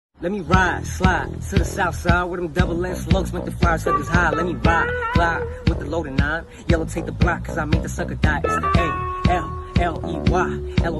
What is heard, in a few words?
chicano rap freestyle